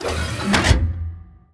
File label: gun reload; World of Tanks